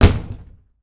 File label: household door slam shut; zapsplat